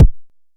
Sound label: analog quick, Kickbank